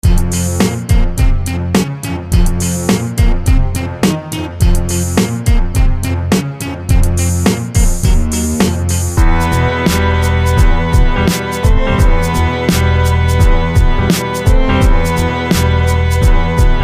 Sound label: Hip Hop Loops